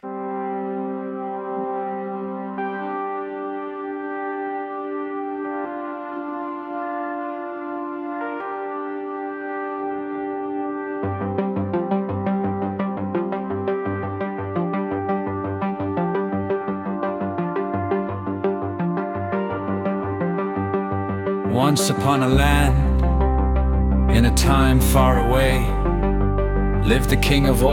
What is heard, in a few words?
techno